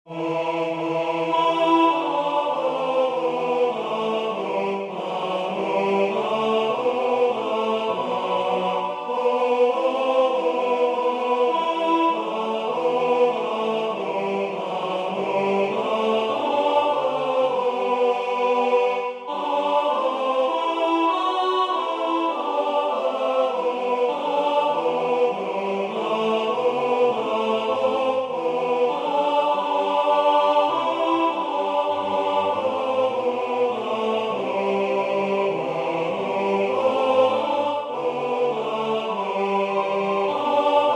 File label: Tenor; God Rest You Merry Gentle Men